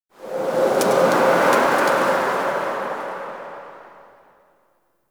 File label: Exterior Forest Wind; Warframe; Ambience; Duviri